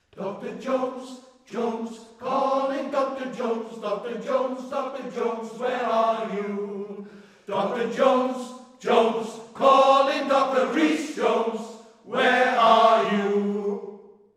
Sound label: BBC RADIO WALES JINGLE; RHYS JONES